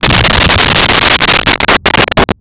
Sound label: explo muffled